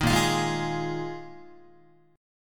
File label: A sharp 7th Suspended 4th, Guitar; A#7sus4; strummed